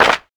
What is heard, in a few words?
hand clap sample e key; single hits one shots